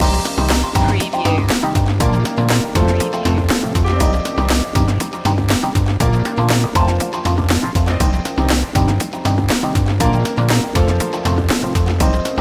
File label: south way underscore loop